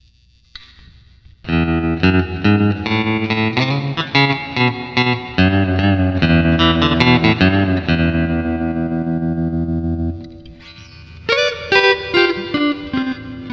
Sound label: Tremolo, Boss GT-8